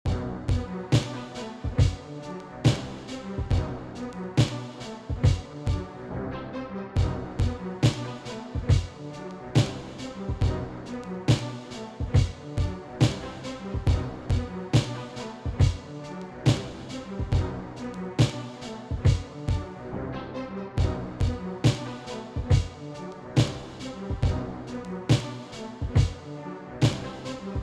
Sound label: Hip-Hop Granulator